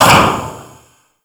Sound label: Obstacle Course Falling Tile End; Warframe; Ambience; Clan Dojo